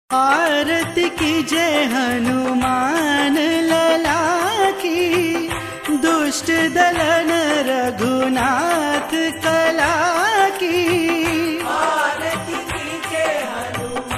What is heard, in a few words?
Hanuman Ji Ki Aarti Ringtone